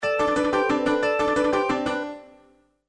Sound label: Classical Reminder; Sony Ericsson; P900